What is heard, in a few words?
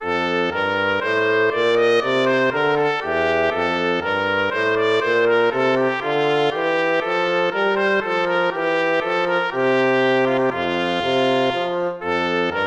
baroque minuet major